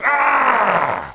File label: ANGER ROAR